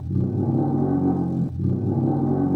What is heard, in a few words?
TIN WHALE; Rarefaction; Poke in The Ear With A Sharp Stick